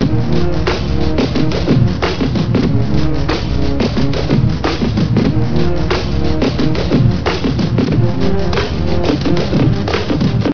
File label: shoot song; blackshades